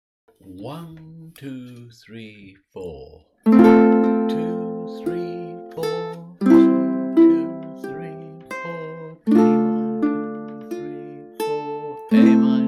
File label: Arpeggio; Pachelbel; brewkulele autumn 2021